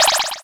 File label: squeek; project hawk